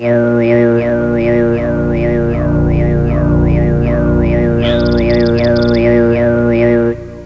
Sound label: chant